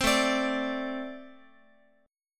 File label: A-Minor Double Flat 5th A Guitar; strummed; JGuitar